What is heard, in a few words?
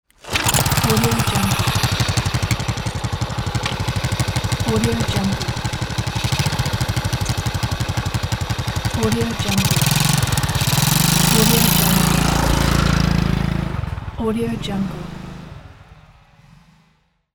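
old motorcycle start up riding away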